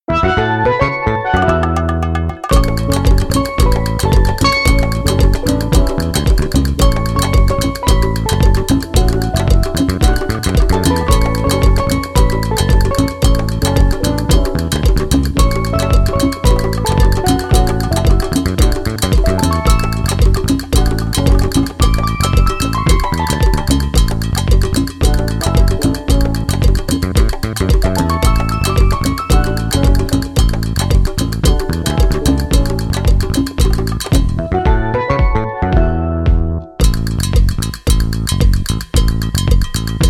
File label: drums of steel